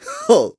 Grab; Ghirahim

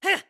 Attack; BOTW; Breath of the Wild; Link

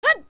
Young Link Attack; OOT

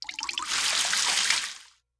Water Pour

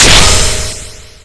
Slash; Toon Link; Final Smash slashes

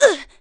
Hurt; Link getting hurt

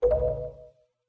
Text Item Close; Close a dialogue box (when receiving a major item); The Wind Waker; Zelda

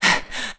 Stamina Lower; Skyward Sword; zelda

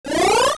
Grow; Link shrinking and growing with the Minish Cap

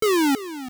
Block Fall; A block pushed down a hole (also an enemy knocked into a hole, Wallmaster descending, and more); Zelda Oracle

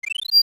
Get Fairy; Getting a fairy; Zelda

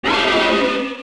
King Dodongo Hit

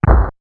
Door; A dungeon door closing

